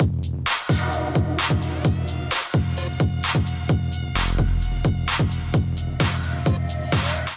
Mafia District Kit 130 bpm

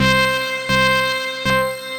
Accelerating Harmonics, Sci-Fi Effects; Ableton; Mood Reel

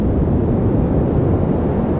Feedback Keys; Press Keys; Tree Tone Presets; Ableton; Factory Packs; Inspired by Nature by Dillon Bastan

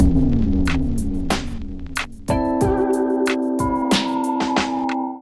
Kit groove 124 bpm, Drums; MIDI Clips; Numerology; Ableton; Chop and Swing

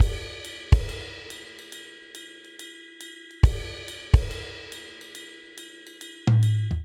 Swing Jazz Breakdown 140 bpm; MIDI Clips; Ableton; Factory Packs; Drum Booth

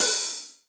Hihat Open; Drum Hits; Cop Style; Ableton; Factory Packs; Session Drums Studio; MPE Hits

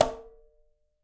Conga C Slap Open; Latin Percussion; Drums; Drum Hits; Ableton; Factory Packs

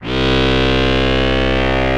Poly Synth, Vector FM; Play Keys

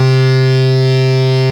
Uplift Transition, Wavetable, Synth Keys; Ableton; Synth Essentials

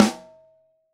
Snare Top

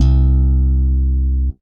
Electric Bass Slap; Guitar and Bass; Ableton; Factory Packs

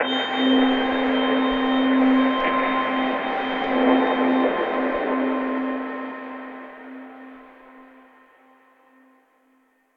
Layer Heaven, Strung Together

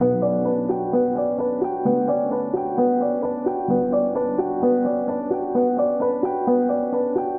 Epic Piano Arp - Bmin 130 bpm; MIDI Clips; Tonal; Motifs; Ableton; Factory Packs; Mood Reel

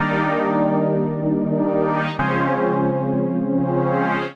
Slow Roll Power Pad (Eb) 110bpm; Music Loops; Ableton; Factory Packs; Singularities

